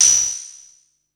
EFX, SFX KIT; Wizoo - Platinum 24 Electronic

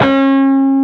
PRS PWR D4C, Guitars; PRS PWR CUTS

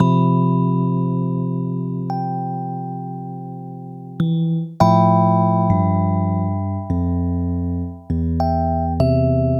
E Piano, hip-hop; Sonic Foundry (Sony Creative Software); Hydroponic Hip-Hop; Keys Synth; Pianos

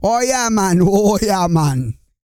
REGGAE, Vocal Planet, World Voices

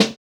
Snare, JUNGLE BREAKS

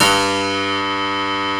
GRAND FF G1, PIANO

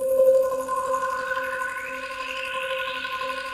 TRICKLERS; Pro-Rec - Synthsonic

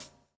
SLICK, Backbeat

